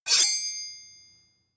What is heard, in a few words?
sword blade grind short ring